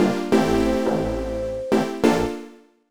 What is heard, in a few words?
Jingle Goal